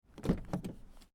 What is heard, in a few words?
car door open external; spoteffects